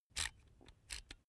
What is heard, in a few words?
electric drill template adjust soft; maschinen; bohrer; soundpool